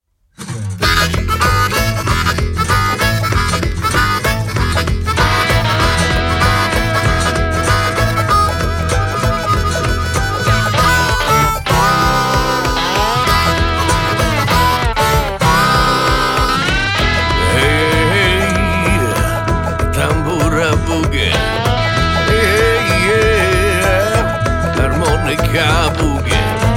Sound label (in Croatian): Tambura boogie